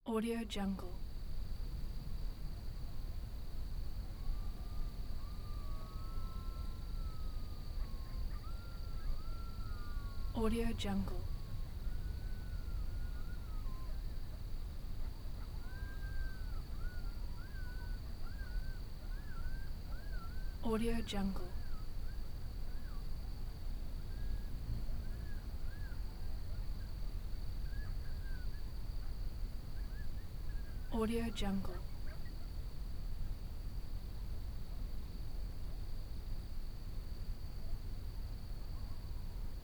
desert

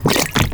water compressed

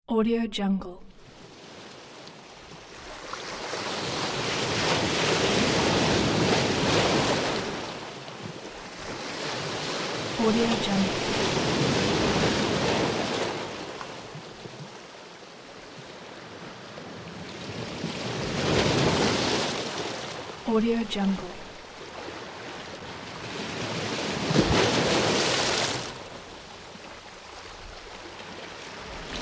calm night ocean waves; alexleadsounds

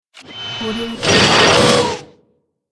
robotic movement

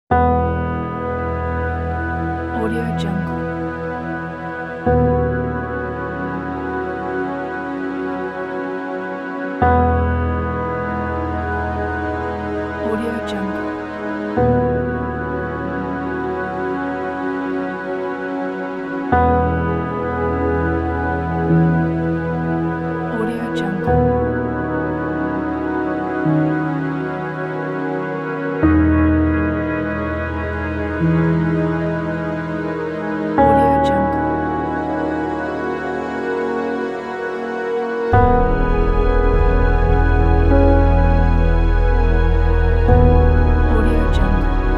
ambient atmospheric pack; aubird

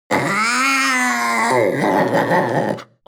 panther young vocal cry; animal; smartsoundfx

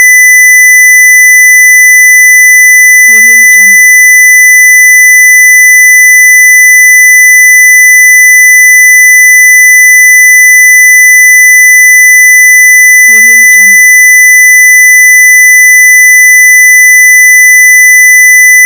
test tone square wave; prosoundfx

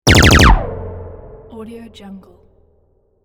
laser gun blast

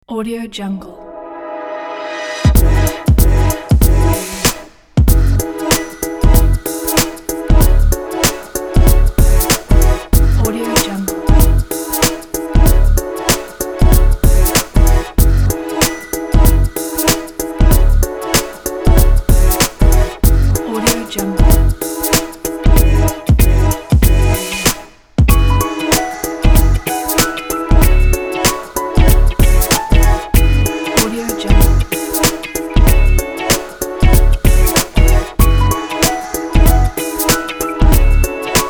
hiphop vlog abstract chill beat

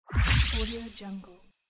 power pack loot pick

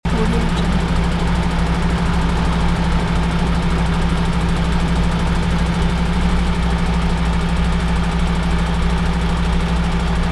truck warm engine idling loop; fxprosound

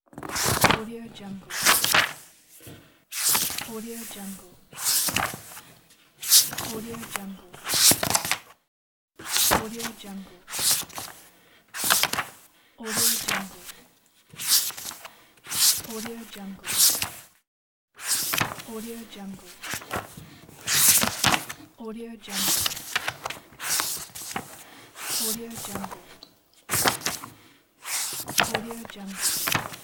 book page flip

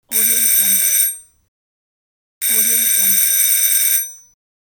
doorbell

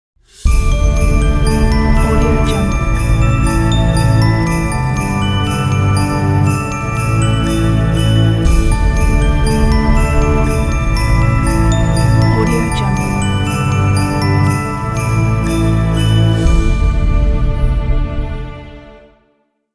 christmas ident

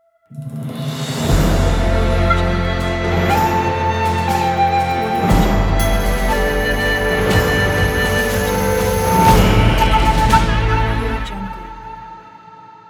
native american logo; airfamily

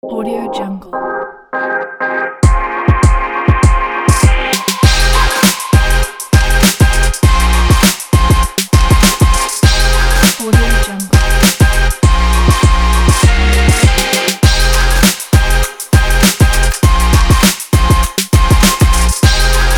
hiphop

